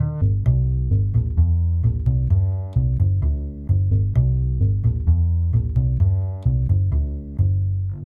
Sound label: bass, 130BPM; Pony Fan Music Remix Stems